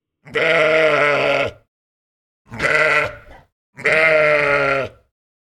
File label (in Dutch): dierengeluid